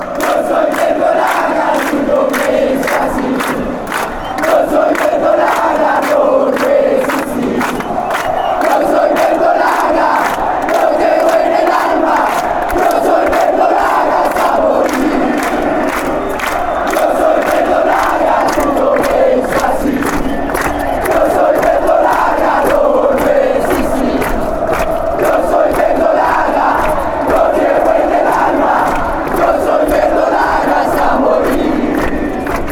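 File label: atlético nacional chant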